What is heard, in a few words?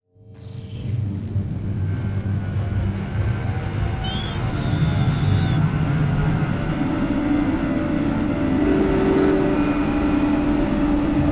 Distortion Static Reverse Spooky; Module Production Element Title Imaging